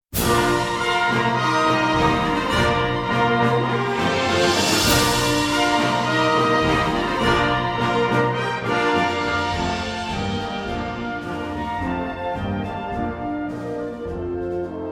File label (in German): Musik Fanfare fuer die Bienenkoenigin